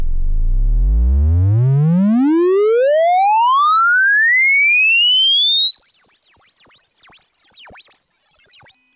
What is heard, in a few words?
triangle sweep